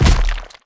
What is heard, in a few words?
hit; DayZ-Epoch; dayz sfx